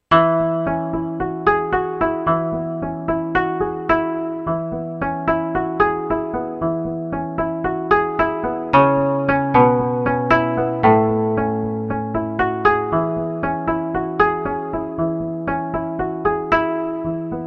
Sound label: japonese